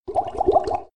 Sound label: Item Potion